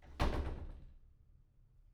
door slam; IEEE AASP

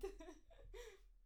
laughter; IEEE AASP

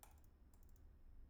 mouse; IEEE AASP

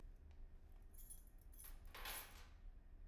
keys; IEEE AASP

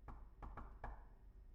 knock; IEEE AASP

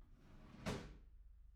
drawer; IEEE AASP

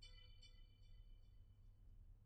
alert; IEEE AASP

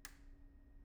switch; IEEE AASP

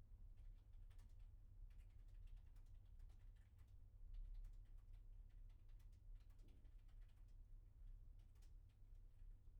keyboard; IEEE AASP

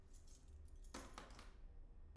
keys; IEEE AASP

